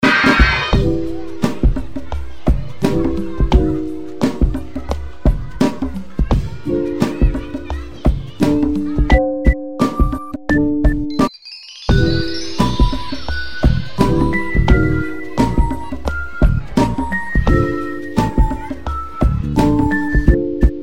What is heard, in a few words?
Swag Loop